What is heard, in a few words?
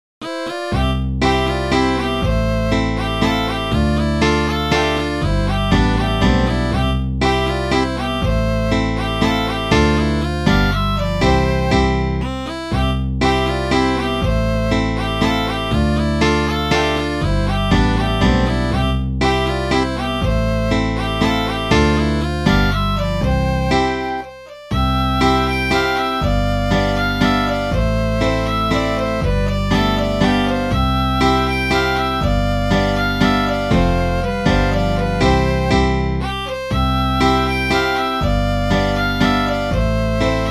mazurka